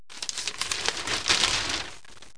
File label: paper